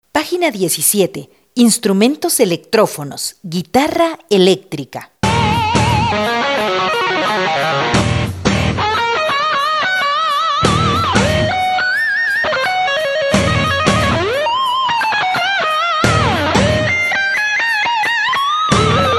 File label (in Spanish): Electrofonos - Guitarra electrica